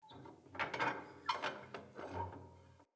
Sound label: Tent Fire Pot Metal Creak; Soul Plane